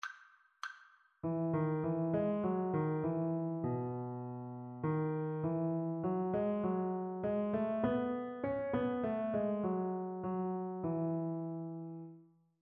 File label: rhythmic dictation; ear training